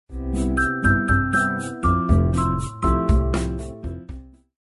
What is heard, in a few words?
On Off; i-mobile